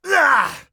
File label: death; Escape from Tarkov